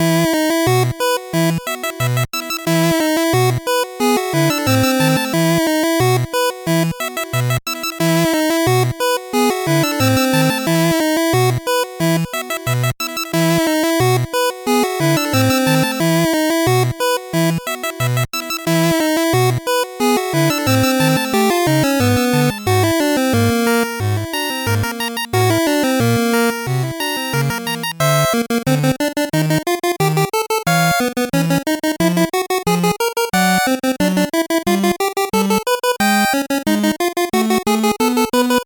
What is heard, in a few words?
8bit bgm normal